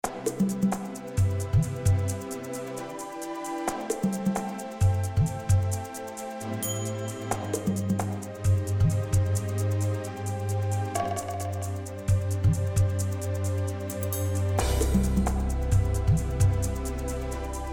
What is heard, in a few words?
rhythm